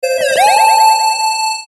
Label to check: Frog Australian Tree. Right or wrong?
Wrong